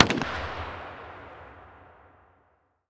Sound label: rpk shoot mono distant